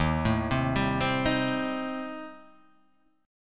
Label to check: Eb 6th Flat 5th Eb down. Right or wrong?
Right